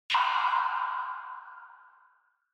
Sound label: Single Accent Dry